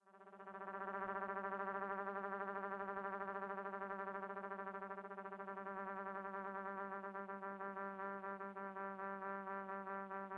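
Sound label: trumpet beats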